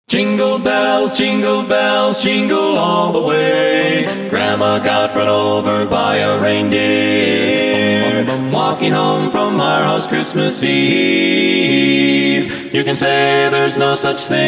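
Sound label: acapella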